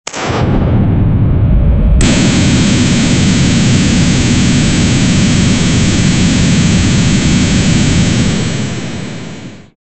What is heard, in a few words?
sound of a rocket launch